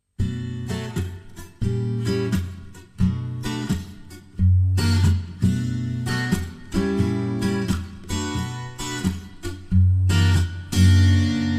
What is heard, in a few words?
blues